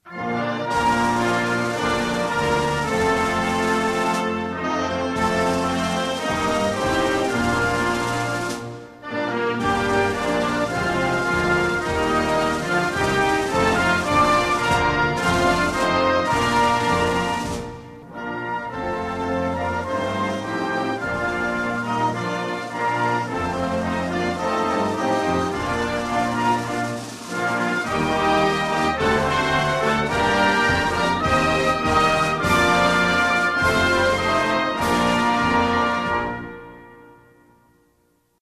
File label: ANTHEM Botswana; africa